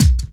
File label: BD, Dance Mega Drums, HIP